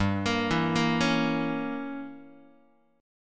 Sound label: G Diminished 7th Guitar, Gdim7 chord; arpeggiated; JGuitar